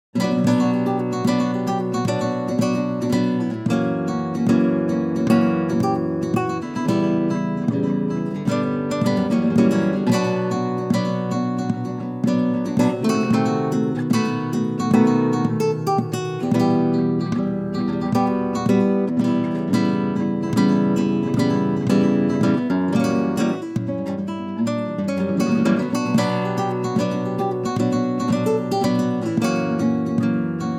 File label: honey nylon; orange tree samples